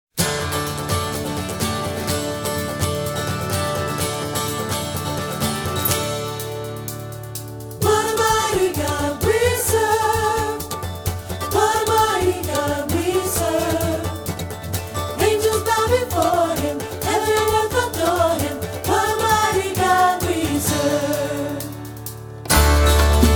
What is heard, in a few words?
acoustic worship